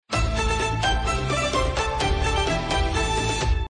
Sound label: total win star